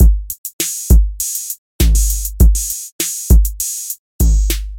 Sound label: Tight Beat